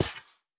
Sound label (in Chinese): 刷怪笼踩踏声